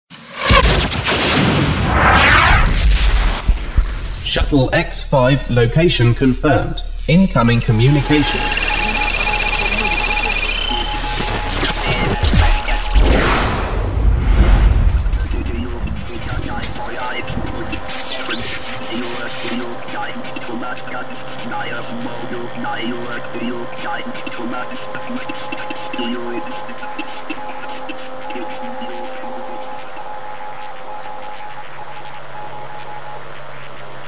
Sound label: Animation SFX